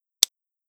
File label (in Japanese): 異音判定